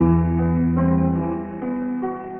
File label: piano, sad loops